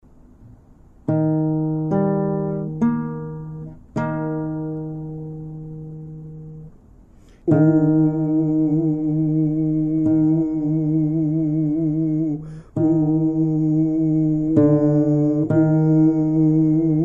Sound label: Bass